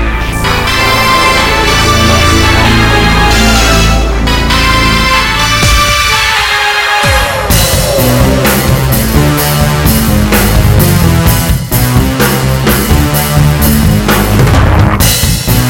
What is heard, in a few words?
Caves Music; Brotherhood United; PS Vita PS4 PS5 Switch Windows Xbox One